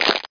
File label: clap; SOMC Family Forum